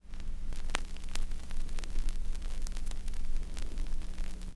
CRCK, Production Effects

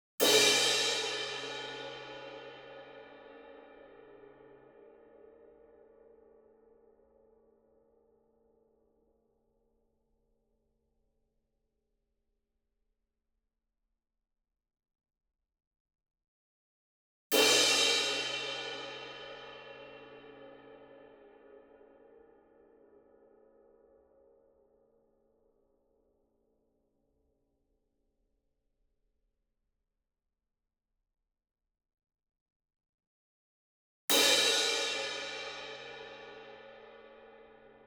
CYMBAL Crash Hit Hard Beater Medium; boom library; Cinematic Strikes Construction Kit

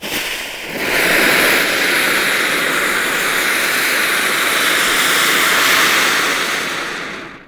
Bomb Fuse, Light And Burn; gun and weapon sounds; captain audio; fully loaded

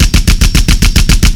Assault Rifle Shot Loop; Weapons

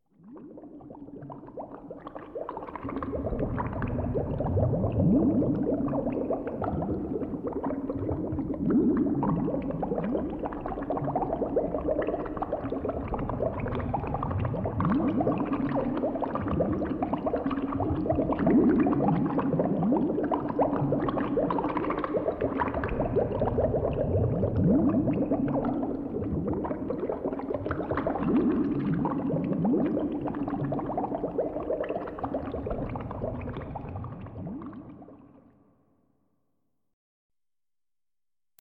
Lab Chemicals, Scary Sounds; 1001 Sound Effects; Halloween Horror Sounds Effects

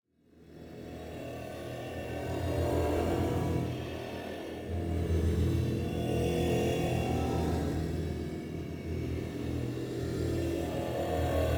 AMBIENCE Grain Nebula; boom library; Matter

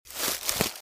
Footsteps - Bush walk - Right; Eden; RadwinIsland

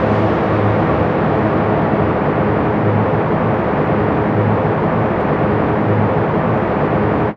car ambi tunnel, street traffic; best service Blue Box

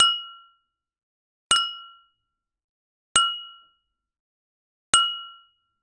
Generic Click Glass Small

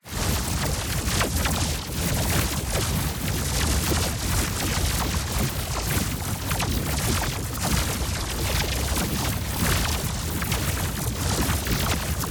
ABILITY CHARGE Noisy Electric Storm; boom library; Matter

